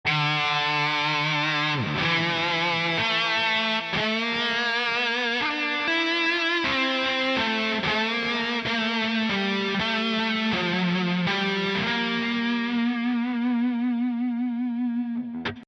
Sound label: lead bar 123bpm, guitars